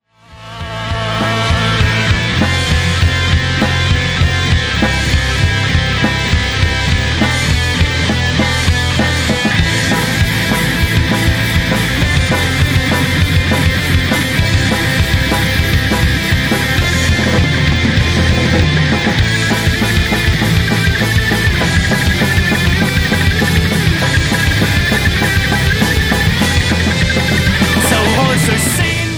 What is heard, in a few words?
guitar